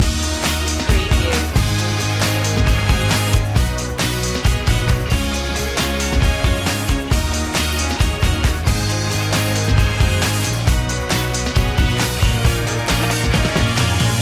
the funky stuff loop

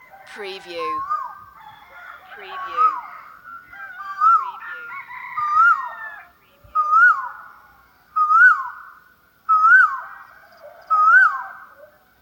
asian koel bird loop

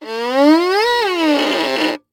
Cartoon Violin

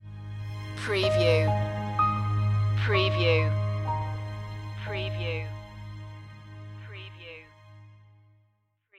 cinematic introduction stinger

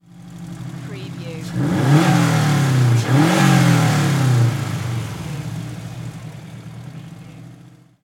SUBARU STI ENGINE DOUBLE REV

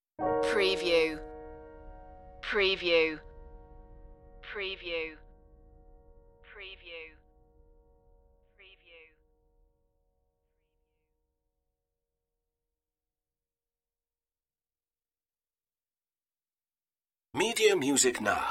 dark wood piano

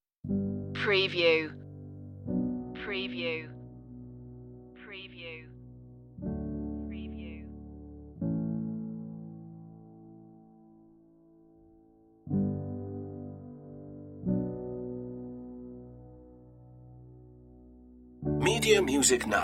no strings

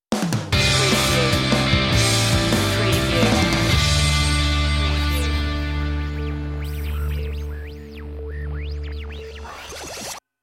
rock out stinger

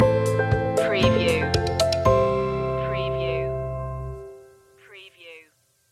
travel the distance stinger

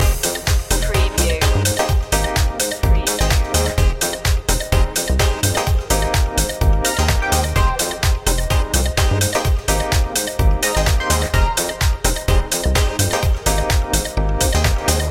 stash up loop

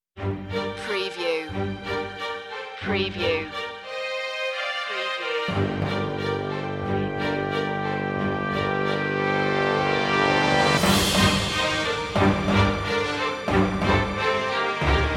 no choir

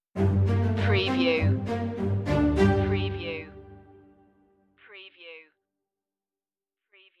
the storm is coming stinger

